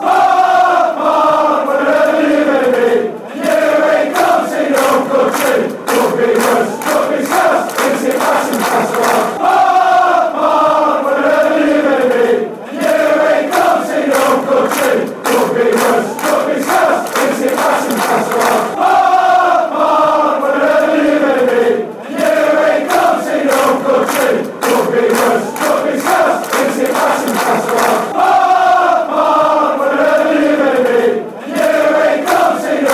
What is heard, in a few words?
park park, chants